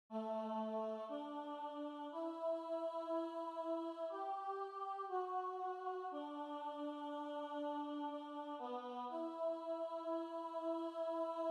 Melody Only

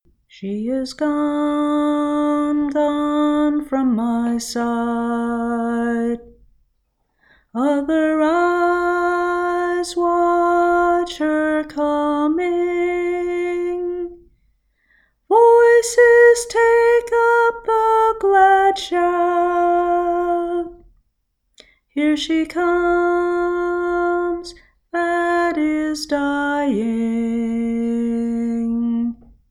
melody

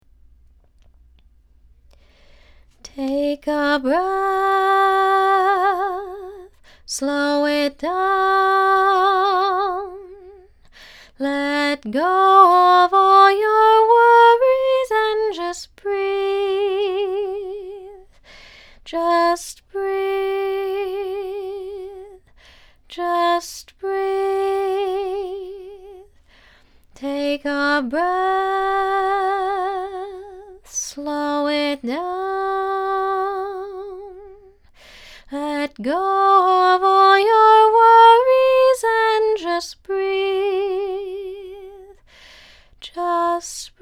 Melody, choir

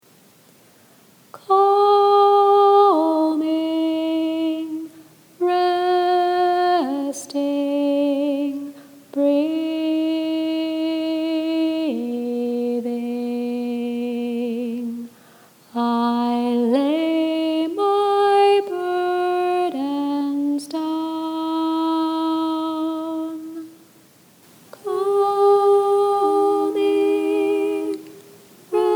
Calming Resting Breathing practice

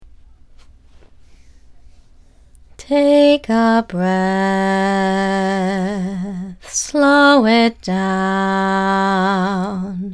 Low Harmony, choir

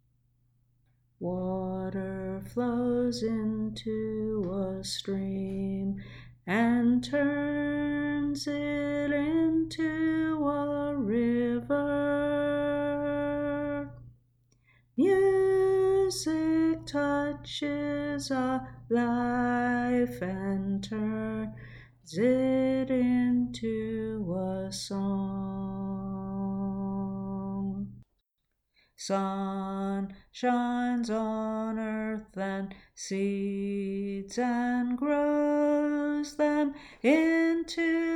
MELODY

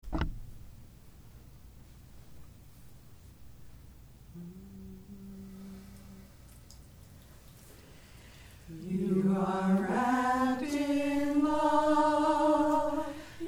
Melody and Harmony

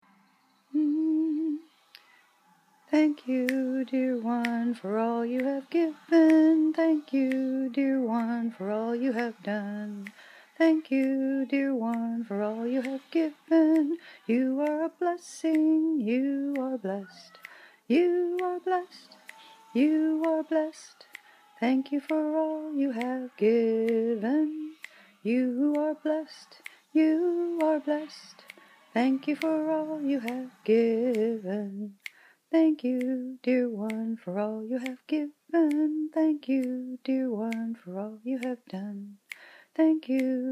Upbeat

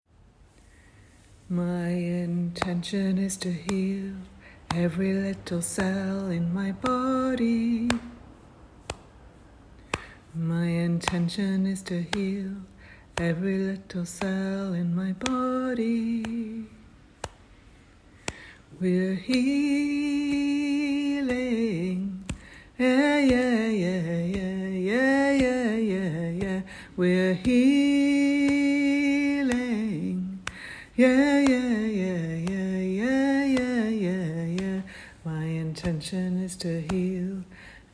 Melody with body tapping

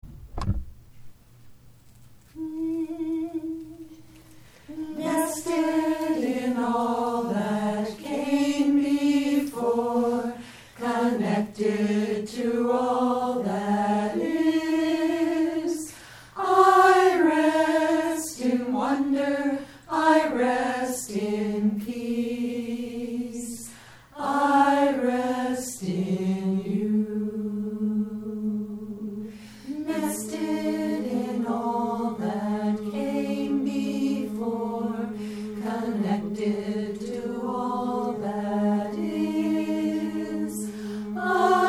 Melody, choir

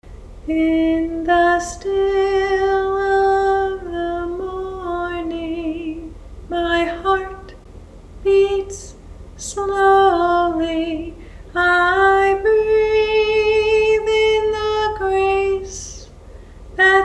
solo version high harmony only

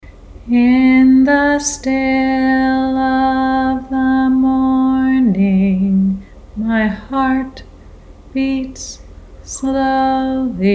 solo version low harmony only

